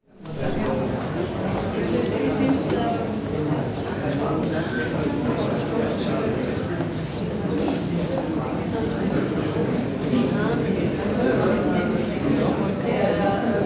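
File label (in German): LEUTE, Stadtgeräusche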